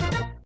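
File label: fanfare end of ball, pinball; WILLIAMS; INDIANA JONES; Indiana Jones Original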